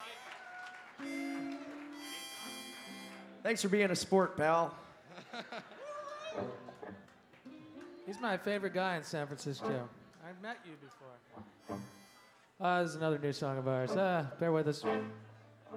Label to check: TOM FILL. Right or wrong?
Wrong